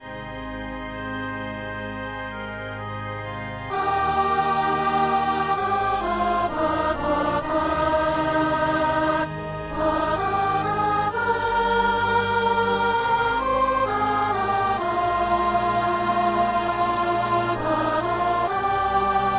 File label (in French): soprano